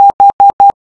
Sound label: Morse H